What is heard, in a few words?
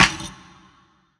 ambient clap one shot g key; percussion; single hits one shots